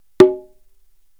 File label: CONGA HI, percussion; drum